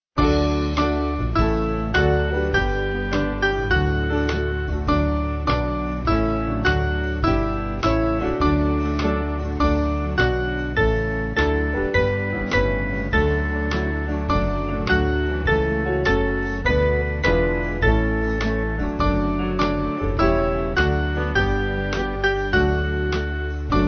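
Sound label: Band, Music Backing Files for Churches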